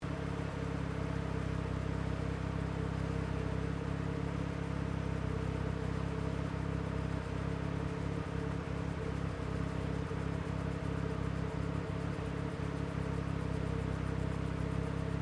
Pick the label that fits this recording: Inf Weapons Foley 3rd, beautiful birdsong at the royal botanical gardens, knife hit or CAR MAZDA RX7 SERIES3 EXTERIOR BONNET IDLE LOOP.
CAR MAZDA RX7 SERIES3 EXTERIOR BONNET IDLE LOOP